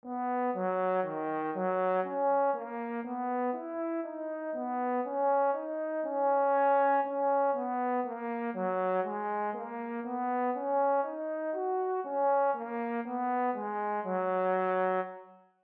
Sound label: Tenor Clef Example